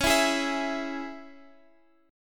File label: Db Minor, Guitar, Standard; strummed; 21,19,18,21,x,x